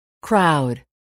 crowd

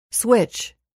switch

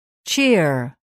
cheer

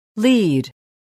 lead

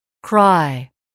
cry

